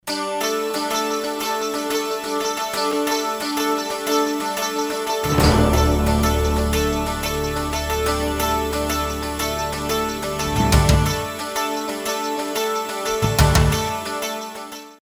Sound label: musicbed sample, contemporary